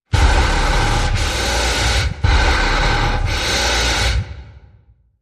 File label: Heavy Demon Breath